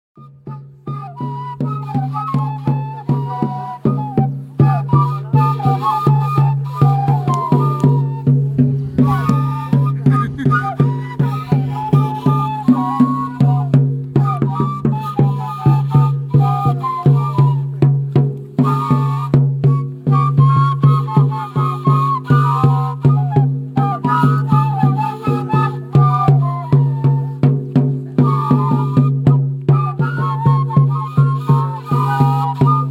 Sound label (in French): Musique Aymara; Discoure Aymara